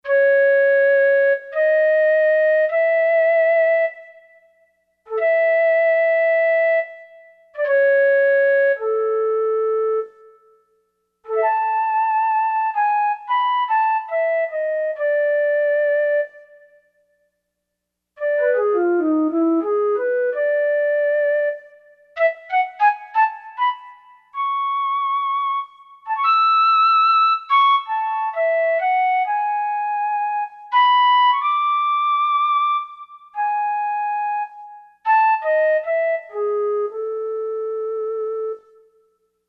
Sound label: Soft Flute, Korg MSC-4S Orchestra; Orchestra 1